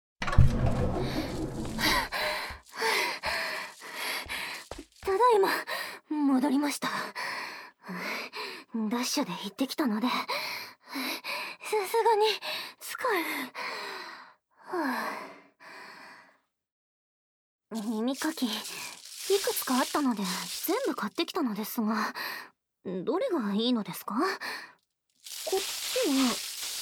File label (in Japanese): 初めての耳かき・左耳, ASMR